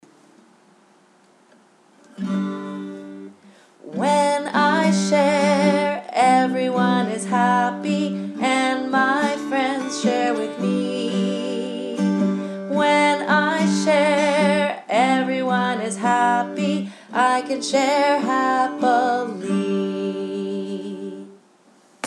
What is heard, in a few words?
sharing song